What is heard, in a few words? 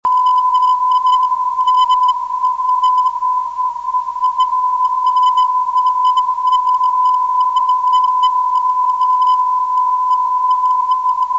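tb ab 420khz, non-directional beacon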